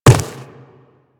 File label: Quadruped Medium Walk Dirt, Footsteps